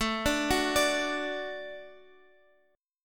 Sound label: F sharp Minor Sharp 5th A